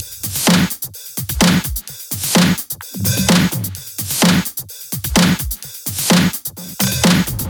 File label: 128BPM Kit; Vengeance Samples; VFH1 128BPM Crowded Kit